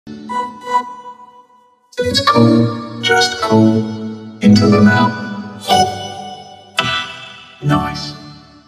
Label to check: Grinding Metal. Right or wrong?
Wrong